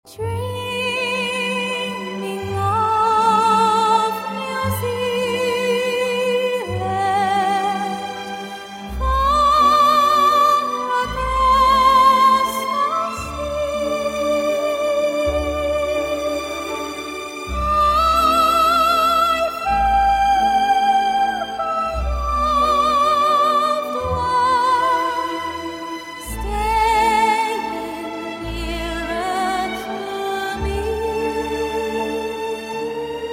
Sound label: vocal